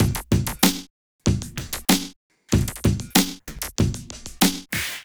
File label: Street Tip, R&B Drums